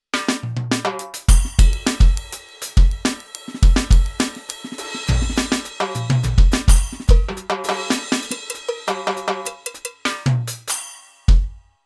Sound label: TD1 Set